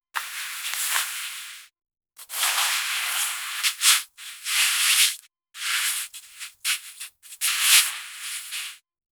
ultra clean dry cinematic, studio

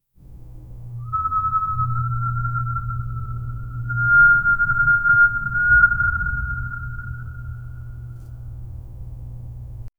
ghostly

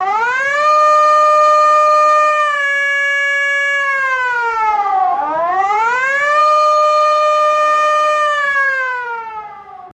an emergency siren in an, bunker

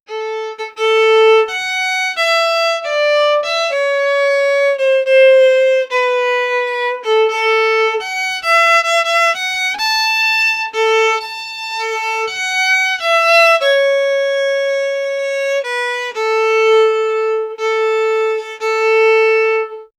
violin classical melody

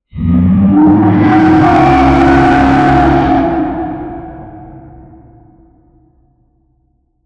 thunderous roar demonic; abyss

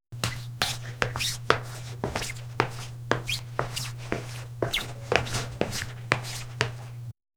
dancing steps in squeaky, shoes

